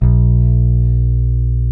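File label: soul electric bass; Roland LCDP02 Guitar and Bass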